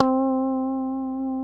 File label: C 4 FUNK SFT, GUITARS, FUNK STRAT; Spectrasonics; Hans Zimmer Guitars VOL-1